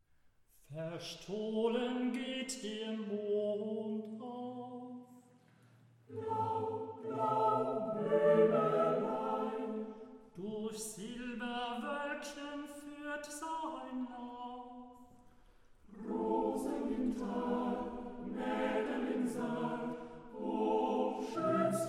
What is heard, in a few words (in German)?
altes volkslied; brahms